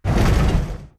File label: bullet explosive titan shudder; Titanfall 2